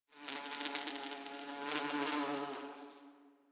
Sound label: Bee; Warframe; Ambience; Nineteen Ninety Nine